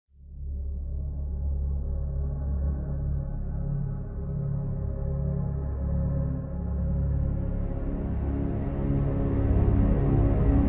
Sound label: Catalogue - Editor's Essentials